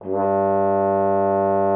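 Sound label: French horn G3; Xsample VOL-3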